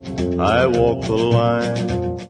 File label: walk line; GARMIN TnT